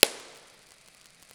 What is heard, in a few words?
Impulse Response MLS